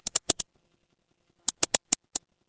office mouse click

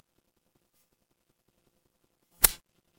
paper fabric cloth whip

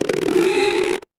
cartoon stretch

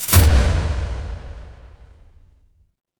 magic scifi portal

